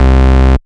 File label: Synth Bass; Vengeance Samples; VEE Synth Shots